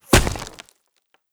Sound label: concrete impact bullet; Rust